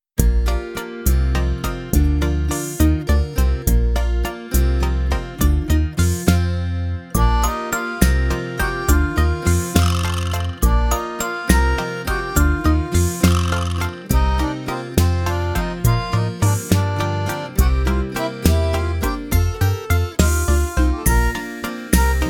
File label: Instrumental